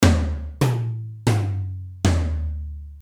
tom, Alesis D4

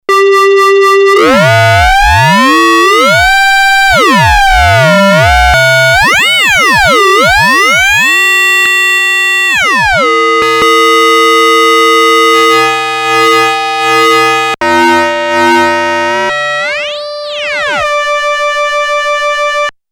ring, novation bass station 2; ringmodulation; analog monophonic machine with subtractive synthesis

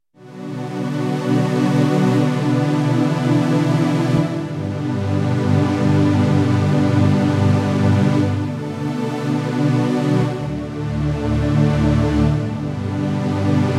pad lush, access virus

